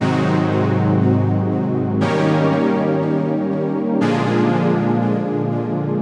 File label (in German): fisdur nebenakkorde enharm